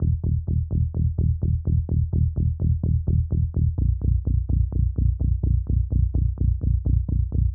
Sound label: bass